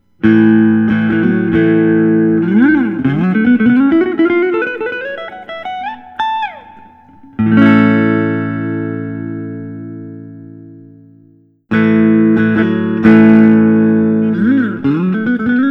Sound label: 2013 gil yaron 54 lp gold top repl; replica